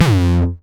909 Grime Layer F2, Kicks; Drums; Mbase 11 Kicks

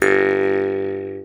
MT G, JHARP G B; Xsample VOL-9

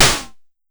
Snare, Pollard Syndrum

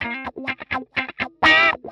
wah C, Nu Jazz House, wahwah; PowerFX - Nu Jazz House

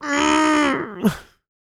MALE HUMAN, Vocal Planet R&B & Dance; ILIO

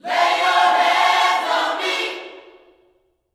LAY HANDS, Gospel; Spectrasonics; Vocal Planet; GOSP PHR

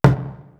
BG KOREAN DRUM; East West; Global Sounds

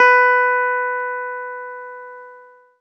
ZITHER; Best Service; Alpine Volksmusik

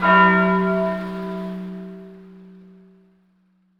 ONE BELL, Cathedral Organ; ILIO; Propeller Islands

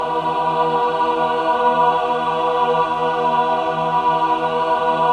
min chord, Symphony of Voices

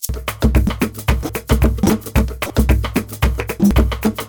SHAKER, Modern Percussion Loops

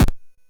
Drums, Hits; DCM8

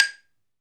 MARIMBA G 3; AKAI S-Series CD-ROM Sound Library VOL-7; MINI MARIMBA

